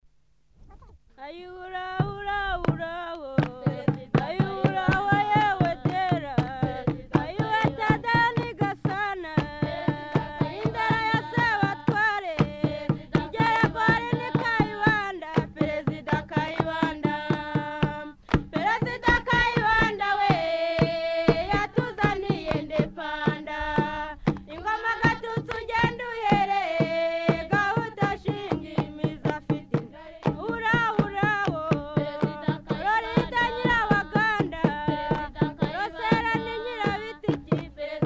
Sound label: ruharage